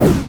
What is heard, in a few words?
Woosh Out; blastmorph pinball; xbox series xs